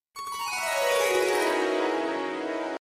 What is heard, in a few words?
Sitar